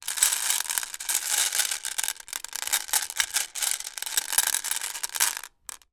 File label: foley marbles many in glass bowl movement with hand